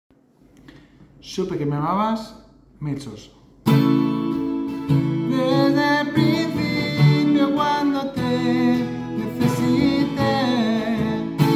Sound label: mezzo